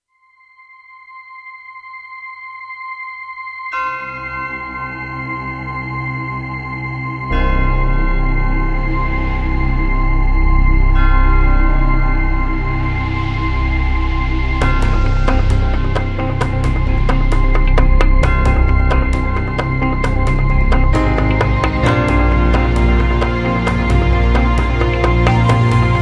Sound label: Shaolin Temple, موسیقی مدیتیشن